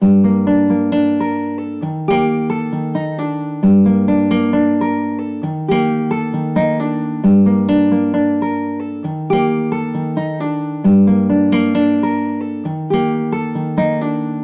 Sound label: Dark Melody Loop - 133 BPM G Min Guitar; Cymatics; Oracle Sample Pack